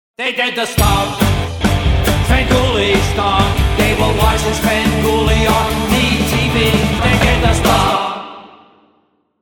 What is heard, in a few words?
Stomp; Svengoolie